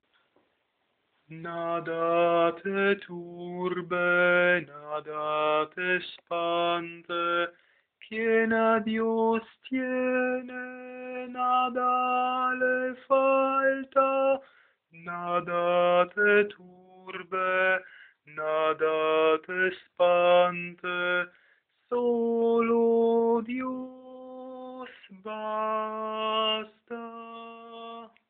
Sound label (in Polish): sopran